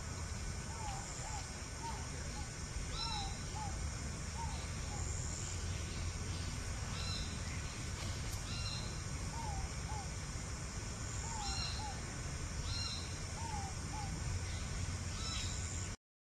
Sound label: Columbina inca Inca Dove, Veracruz; Tortolita Mexicana; Colombe inca